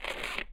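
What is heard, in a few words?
gritty cement shuffle, footsteps; chief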